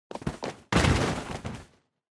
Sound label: Motion Fall Stumble Long